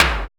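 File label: industrial lite snare, techno; Drums In Your FACE!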